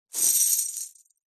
foley keys large bunch prison guard pick up from carpet